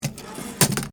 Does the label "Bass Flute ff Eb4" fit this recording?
No